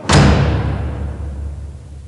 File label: ship slam; factory; CosmicRage; CosmicRageSounds